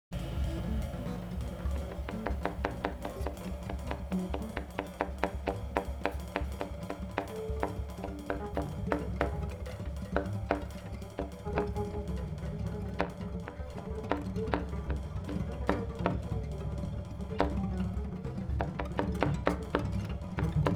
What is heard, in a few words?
NEW JAZZ ENERGY